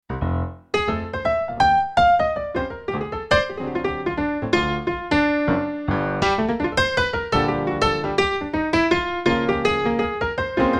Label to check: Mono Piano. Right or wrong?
Right